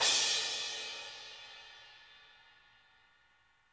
crash; Sonic Reality; Interactive Drum Kits; 90s sample cds

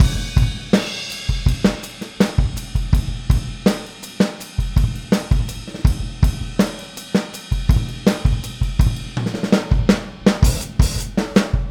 Boogaloo Fill Full Mix, 82 BPM; Sonic Foundry; Drums from the Big Room The Mixes

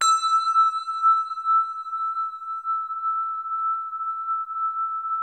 GLAS E4, ATMOSPHERICS; Music Store - Claudius Diemeter Schwerlos

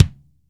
mix kick, fusion kick

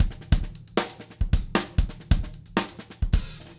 133, drum

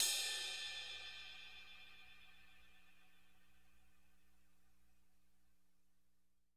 crash tip

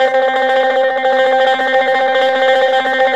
ELBZ TREM, BOUZ ELTR, Mediteranean Traditions; USB Soundscan vol.53; AKAI

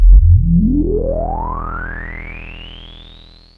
E2, Synthesizer, JUNO 60; Hollywood Edge - Giorgio Moroder Rare Synthesizer Collection

